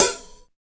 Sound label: HAT RING; East West - Phat & Phunky